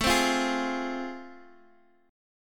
A Minor Major 7th Sharp 5th, down, Guitar, Half Step Down; AmM7#5